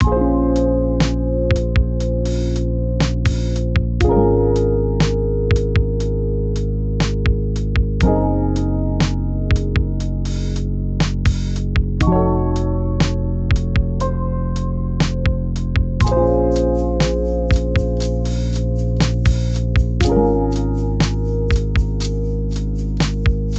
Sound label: Lo-Fi